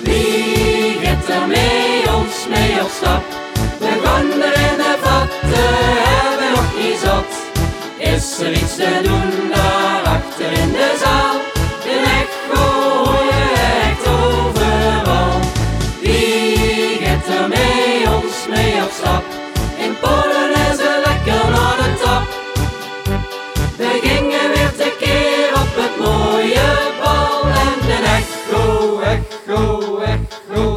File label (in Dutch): Band Zonder Instrument